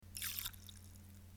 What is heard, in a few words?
emptying syringe in water slow